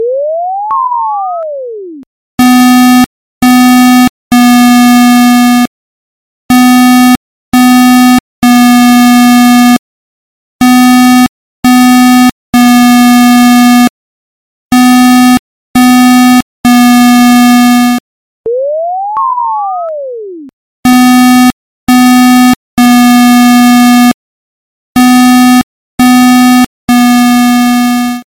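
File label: Old Clock Alarm Ringtone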